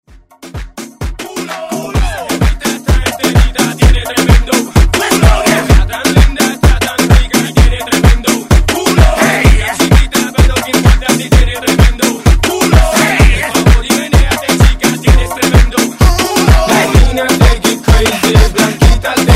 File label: Afroculo Redrum Hype